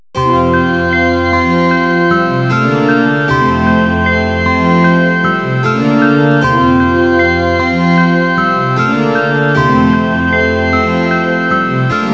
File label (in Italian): musica newage